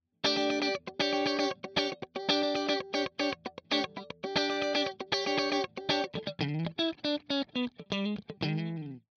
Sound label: Funk more treble Torpedo Orange 2x12